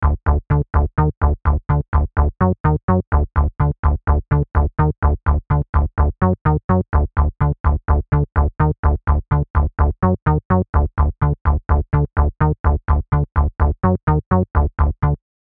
Stylophone Sampler Seq Synth Bass

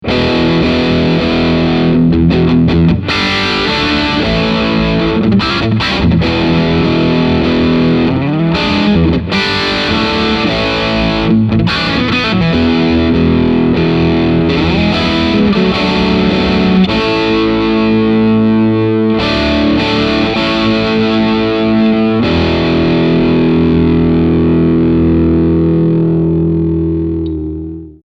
Overdrive

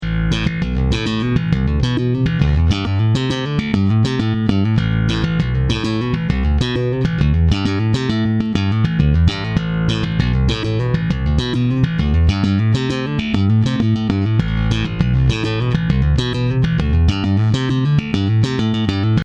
slap

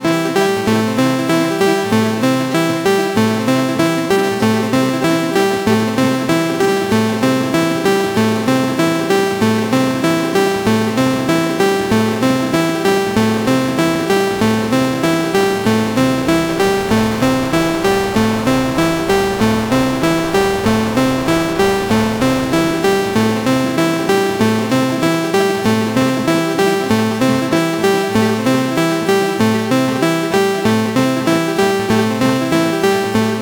Bastl Thyme Extra Heads Spacing Robot Rate

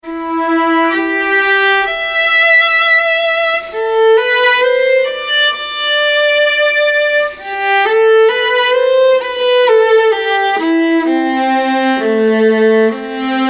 Violin LA2A

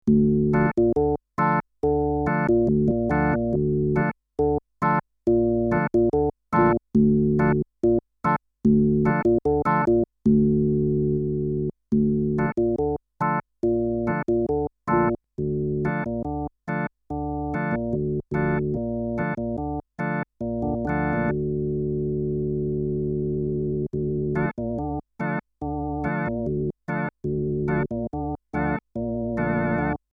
Reface YC; Rack

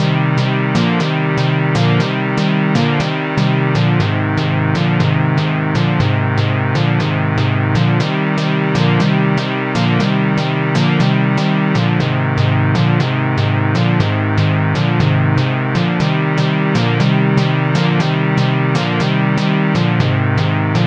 Pluck Dry Wet 120

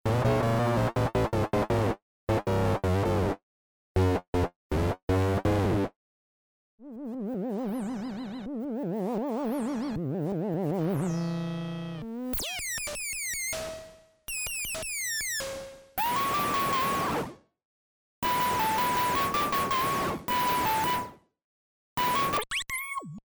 Vacuum FX

